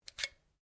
RIFLE CZ 452 VARMINT 22 CALIBRE MAGAZINE RELEASE; A selection of domesttic firearms; Rifles

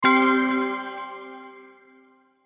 Hit Bells Delay Flange; Production Element Imaging Element Accent Transition

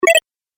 Collect Chiptune, video game; Chiptune Fx

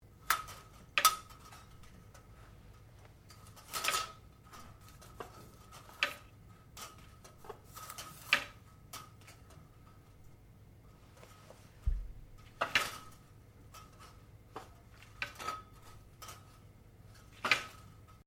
Blinds Venetian Movement, Household blinds

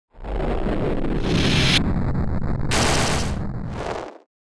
Distortion Left To Right; Distortion Swell Right To Left; Swell Chopper Grind Dark Low Reverb Production Element Imaging Element Accent Transition

